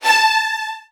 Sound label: A3, Strings, VN 16TH; ILIO; Kirk Hunter